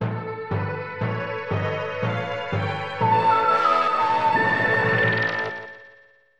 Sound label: Out Of This World Realm Fanfare; Glover; Nintendo 64